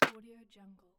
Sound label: metal ladder footstep; stormwaveaudio